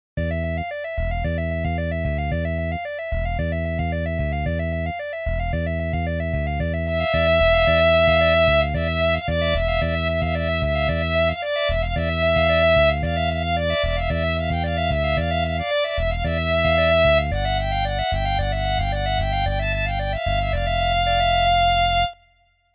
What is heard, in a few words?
Violin